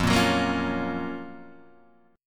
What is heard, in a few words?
Gb Major 7th F, guitar